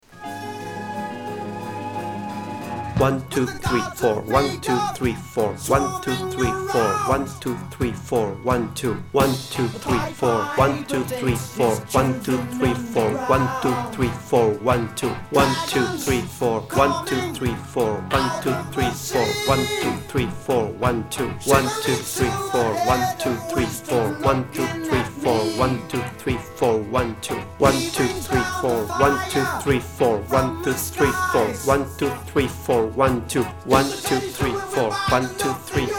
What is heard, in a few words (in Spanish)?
Apocalipse hasta solo de organo WITH voiceover cuenta